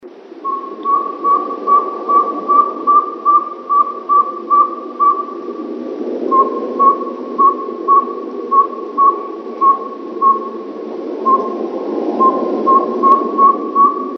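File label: SAW WHET OWL TOOT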